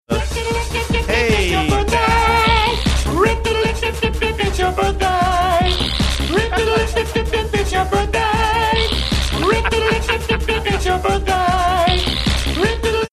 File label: playing fart sound along with rip dip dalip dip dip dip